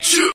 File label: "choo"; ooh ahh